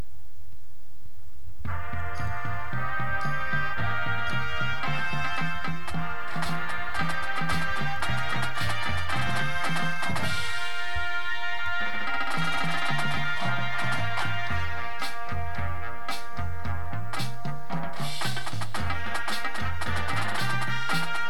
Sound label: full drumline with music